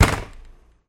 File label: slam